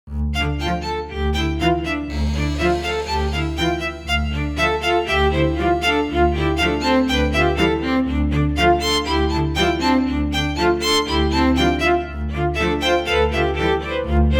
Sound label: Puzzle Dugeon Song